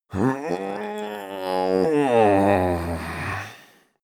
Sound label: male growl wake, koerpergeraeusche; soundpool